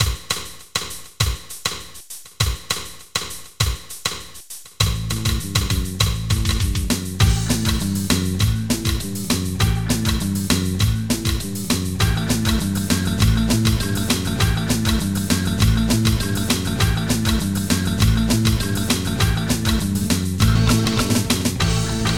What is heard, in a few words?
big band blues